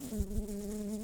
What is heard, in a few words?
Humilis workers, bumblebees